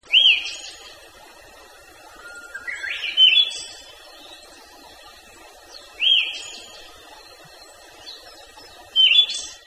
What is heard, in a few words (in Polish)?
drozd ciemny (syberyjski) - Geokichla sibirica; żyje na Syberii; wroblowe; turdidae